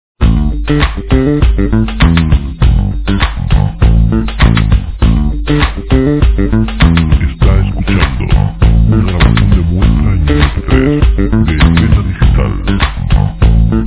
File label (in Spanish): bajo bate; jazz